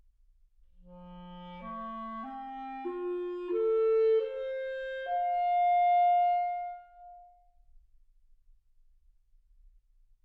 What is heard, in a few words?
clarinet